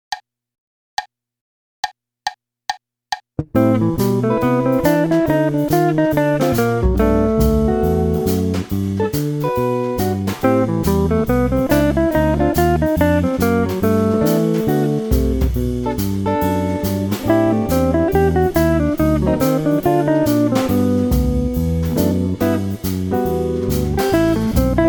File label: phrygian jazz lick; bergeron guy